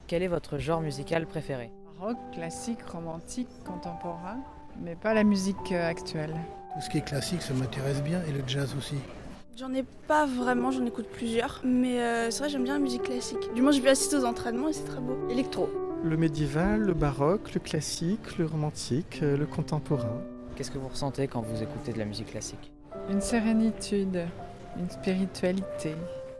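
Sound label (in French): Musique et les émotions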